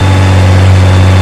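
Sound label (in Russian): звук двигателя разгоняющегося самолета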